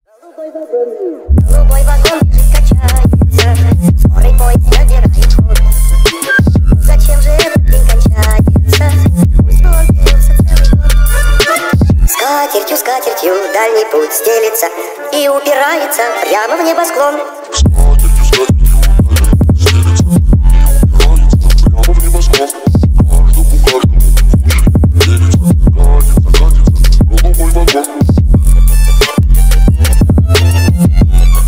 phonk remix

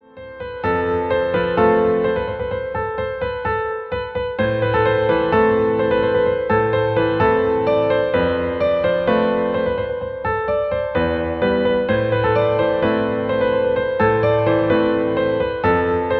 piano cover

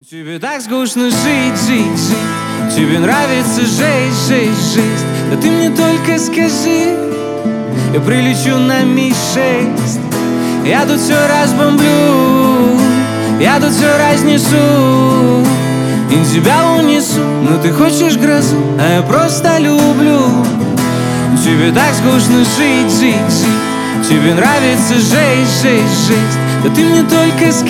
acoustic version

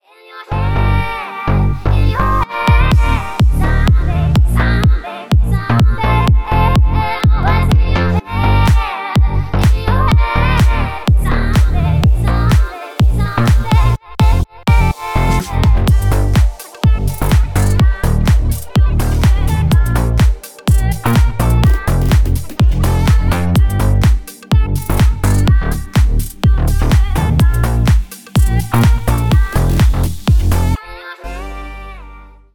slap house remix

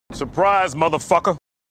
sms iringtone

